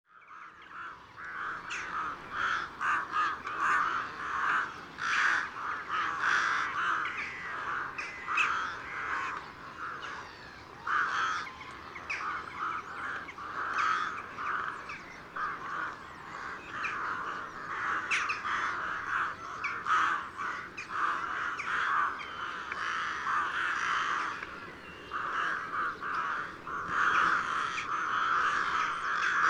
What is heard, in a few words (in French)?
champs d hiver